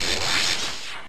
Zing Zap; pokemon reborn e19 2022

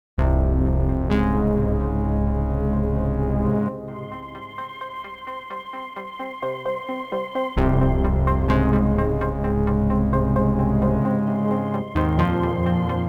Day Amb Stinger, gamerip; dying light

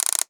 mechanical gear switch

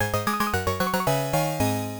Game Over Music; Motos; x68000

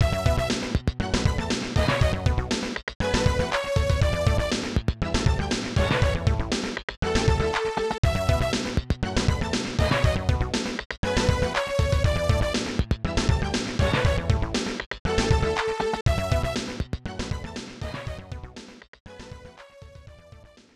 Qualifying Race Fanfare; Exhaust Heat; SNES

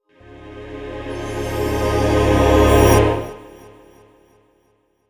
Holy Revival

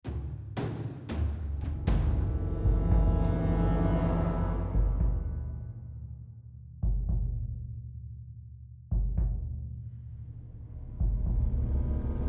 Tension Block; The Last of Us

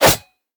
Whistlers Attack spear Bot; we are the dwarves gamerip 2016

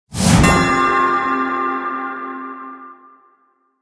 contract complete

